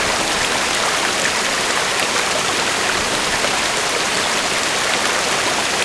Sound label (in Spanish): cascada